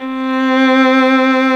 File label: VIOLIN C4, SOLO STRINGS; Greytsounds VOL-1